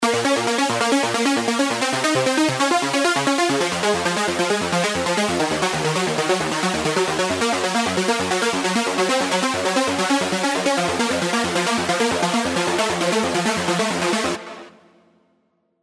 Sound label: Trance; serum super saws